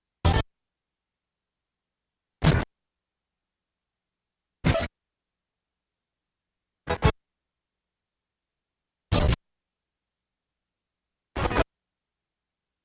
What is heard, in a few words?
noise cd; MEDIA